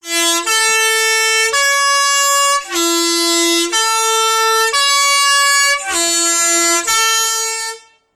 klingelton schweizer postbus posthorn melodie; postauto

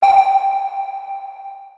u boot sonar ping sound

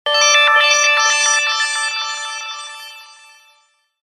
ipod sms toene iphone style

